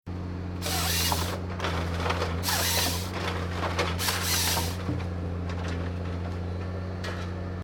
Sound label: Industrial Forestry Tree Harvester Cutting Logs Into Pile Close Perspective Chainsaw; Construction Vehicle; Tom Hutchings